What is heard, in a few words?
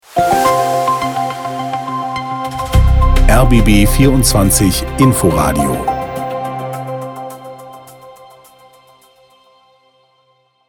Opener